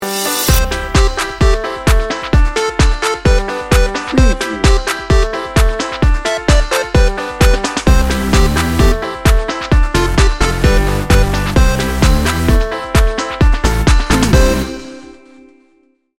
beach jam 15 second

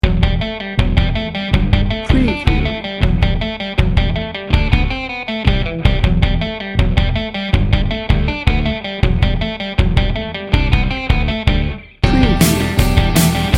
indie pop rock

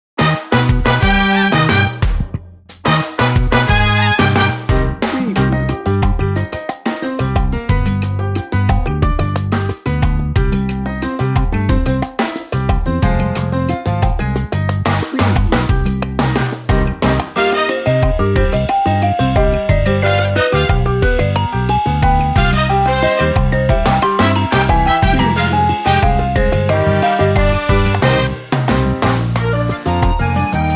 salsa party

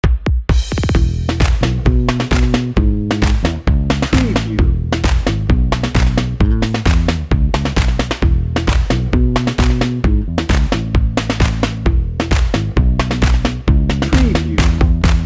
dnb

